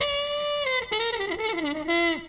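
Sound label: brass trumpet; AMG - Now CD-ROM (Roland)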